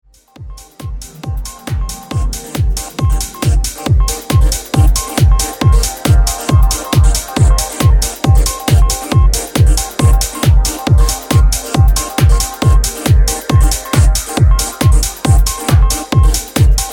Prayer Bass

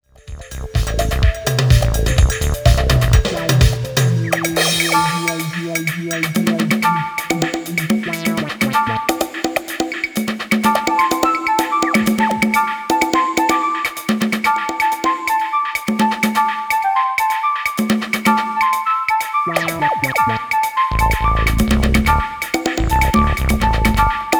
(Flute Version); WATAJ Recordings

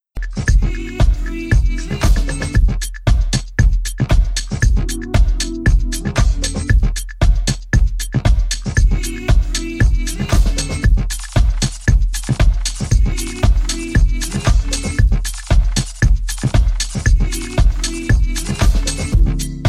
Hammond Noise

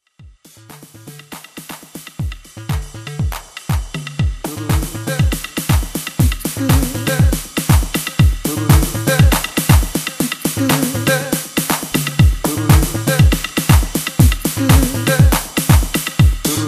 techno roll

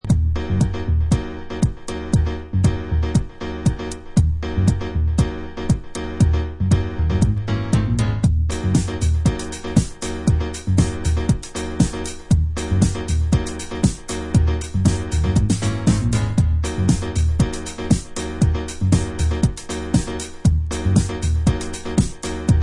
(pianopella)

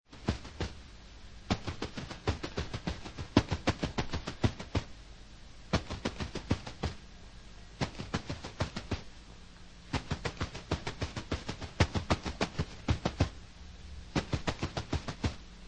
Ostrich Feathers Played On Drums

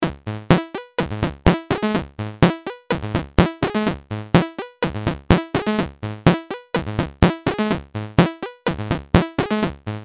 synthesized rhythm

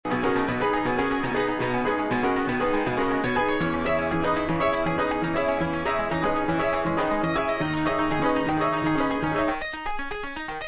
pocket piano; House

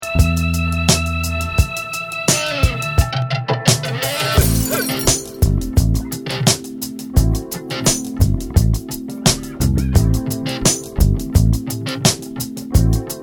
Soup fiction (instrumental)

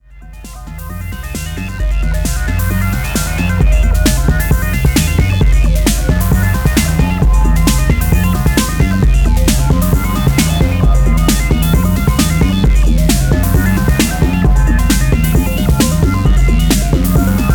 Analog Devices

